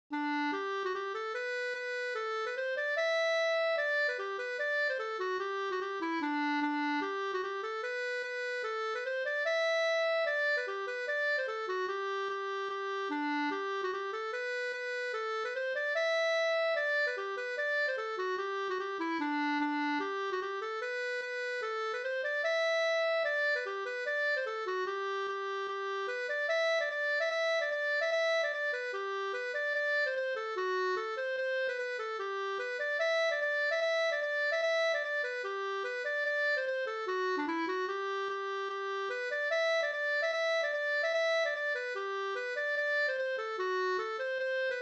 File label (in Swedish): reinlender